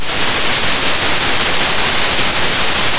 Sound of a thunderstorm

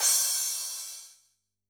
Crash, drum

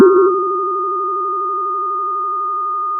pop, Sound of a church bell

